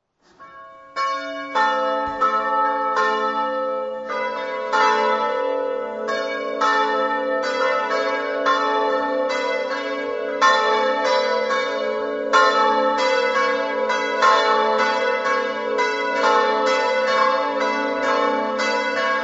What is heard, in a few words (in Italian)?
fiumicello, campane